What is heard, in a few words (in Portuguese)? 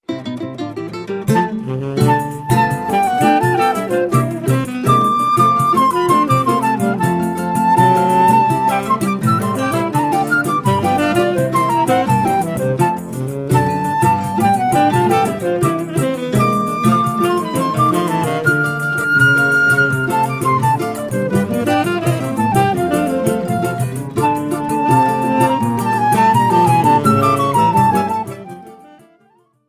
flauta e sax